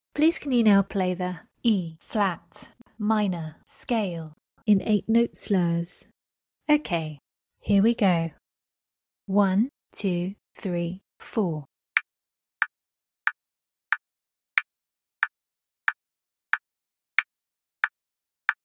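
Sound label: E flat minor scale 8 note slurs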